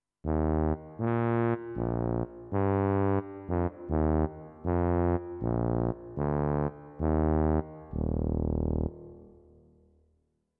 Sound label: Tuba